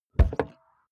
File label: car bmw e46 interior door rear open; Cars